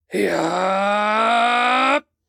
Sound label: Battle Cry; Galien